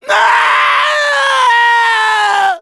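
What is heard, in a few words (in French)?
Soldier pain critical death, fr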